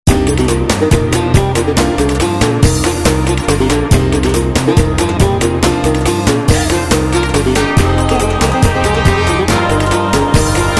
afyon kirik hava 9-8

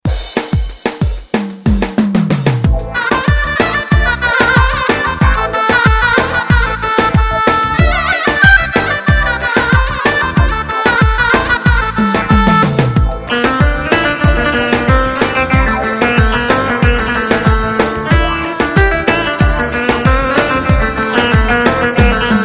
or700 v2 plus halay

